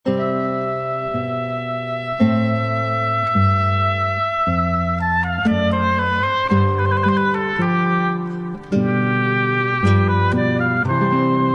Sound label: Bach Guitar